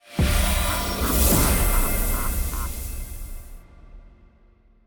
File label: freespin outro; godsofgold